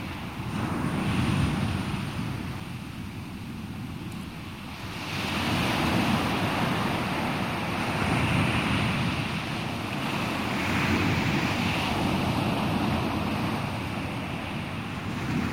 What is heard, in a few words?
sea waves wind